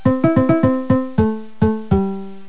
UKULELE

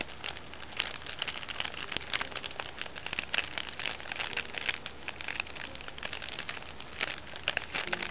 FIRE

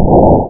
bwat

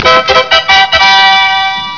flourish

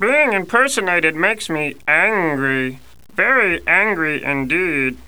angry

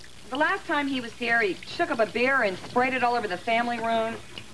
shak beer

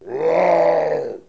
roar

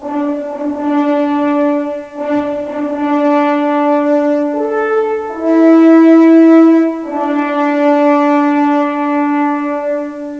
HORN CALL

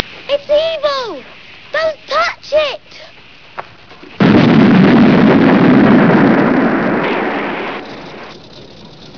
EVIL

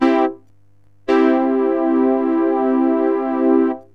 MAJOR, SYNTH; MS6 BRASS

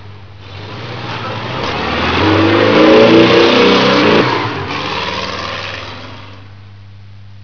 Start

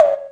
POP, IMPACTS

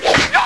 TCHAK

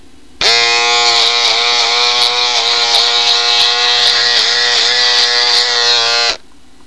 electric, house

